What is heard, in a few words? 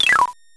Yoyo; Treasure Galaxy (1994); Learning Company